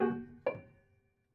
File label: Prepared Piano